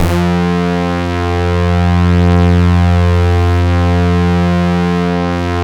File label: LEAD BRS F2; Drums In Your FACE!; LEADBRASS